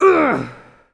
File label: grunt; Superbike 2001